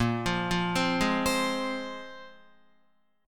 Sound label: A sharp 7th Suspended 2nd, Guitar; arpeggiated; JGuitar